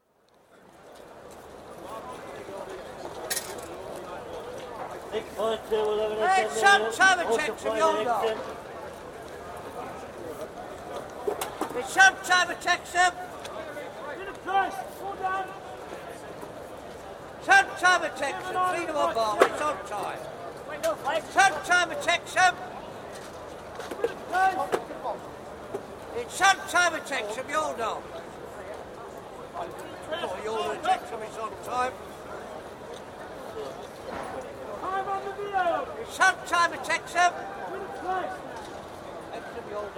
National Hunt Racing, bookmakers (fairly subdued) with audible horses' names & odds, also chinks of coins, footsteps & distant helicopter; Miscellaneous Sport; BBC